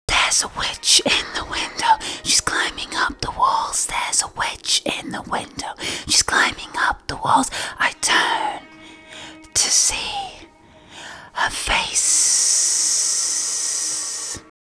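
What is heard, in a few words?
new vocals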